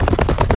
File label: run